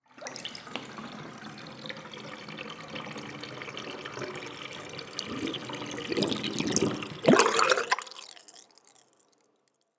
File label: Water Draining